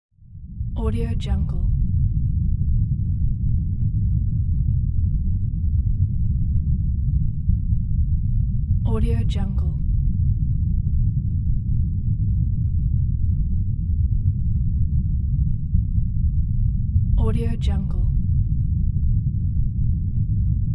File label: horror background noise